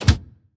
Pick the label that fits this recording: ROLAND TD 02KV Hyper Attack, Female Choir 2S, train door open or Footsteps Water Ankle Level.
train door open